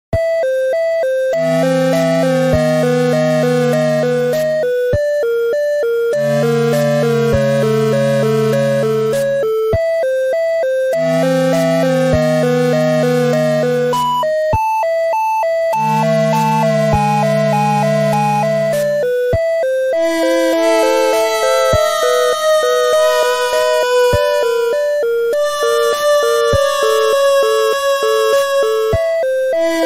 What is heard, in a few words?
Ghost House Theme